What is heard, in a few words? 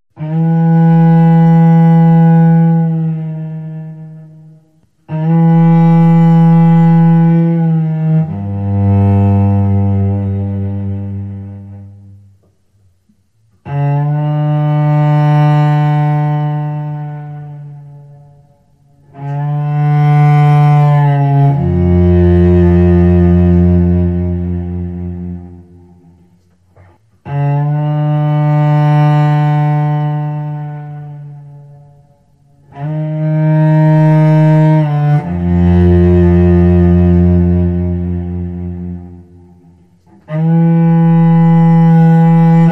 Cello Whale Song